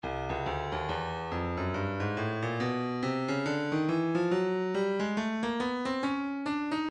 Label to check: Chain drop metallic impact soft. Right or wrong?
Wrong